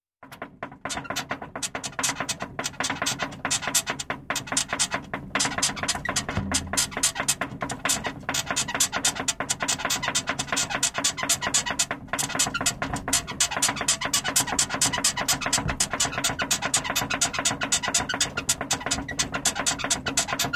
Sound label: Squeaky bed